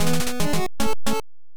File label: Bonus Stage Start; milon s secret castle nes